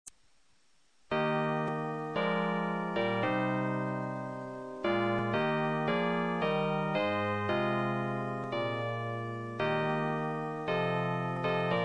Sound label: Oh Canada TENOR; Learning Tape MIDI